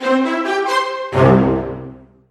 level Complete; JetTank

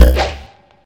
Bow; MQ2

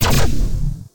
crit Sound; BattleBuddies

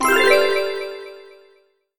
clock Sound; EggHeads

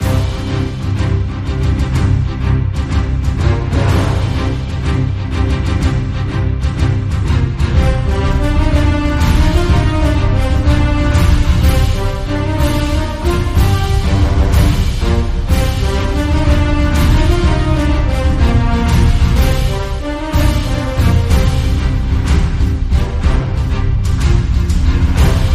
Battle Theme; MQ2

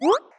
Jump; LetterLeaper

